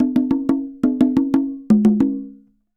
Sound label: Congas Poly, Festa Latina, Drums & Percussion; Best Service; Festa Latina VOL-1